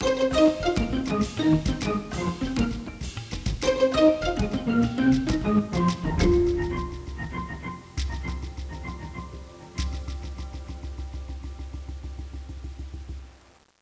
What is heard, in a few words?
MENU END; Civilization II